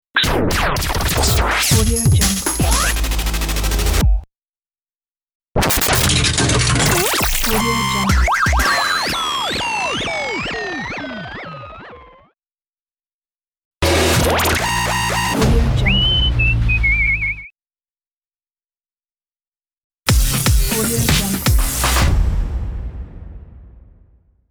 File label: radio imaging jingle fx pack